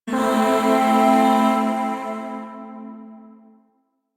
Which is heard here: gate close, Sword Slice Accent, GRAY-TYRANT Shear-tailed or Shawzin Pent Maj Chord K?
Shawzin Pent Maj Chord K